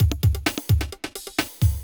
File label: electro kit 130